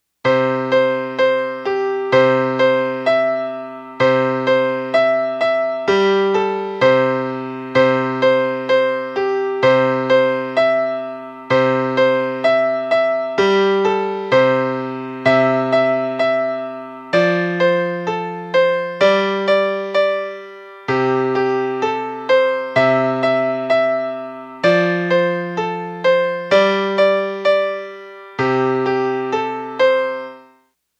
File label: melody